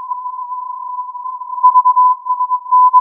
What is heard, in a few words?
filtered input